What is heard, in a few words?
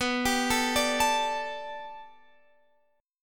D Suspended 4th B, guitar; Dsus/B; arpeggiated